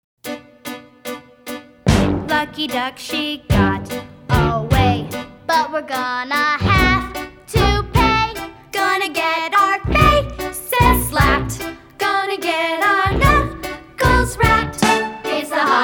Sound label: reprise guide